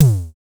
DSM2 Tom; MPC DSM-2

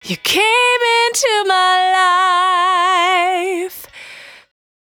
Cry 68bpm B, Vocal; Zero-G

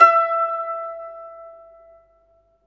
SITUR E5; Spectrasonics; Heart of Asia

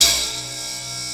SPRING

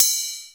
RIDE BELL, Alesis Hr16, Drums

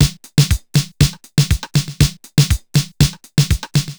TR 505 (120BPM), beats; musicradar; cyborg beats

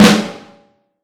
Vintage Snare Drum; Q Up Arts; Johnny.C's Vintage Snare Drum; 7X14

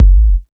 bassdrums, techno; Techno ID; single-drums

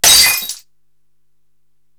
Glass Breaker; Korg TR-Rack

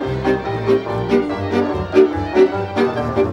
VIOLA BREAKS; Best Service; World Colours

